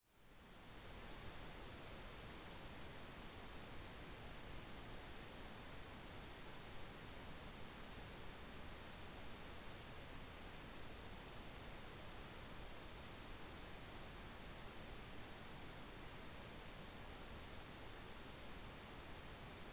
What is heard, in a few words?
cricket